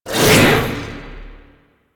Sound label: Crack Cast; Warframe; Warframes; Khora